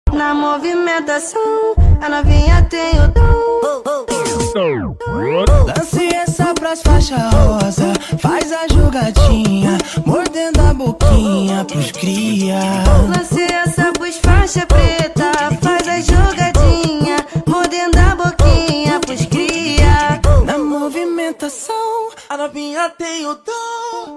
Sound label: attitude ringtone bgm ringtones english ringtone; bad boy ringtone